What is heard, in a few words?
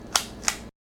Gun load